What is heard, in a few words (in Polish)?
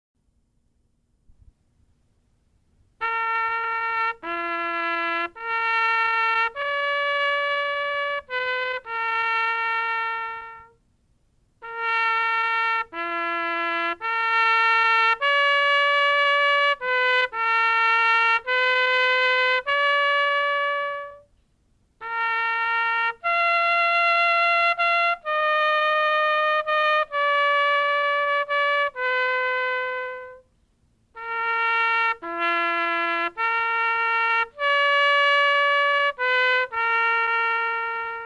hejnal miasta gostynina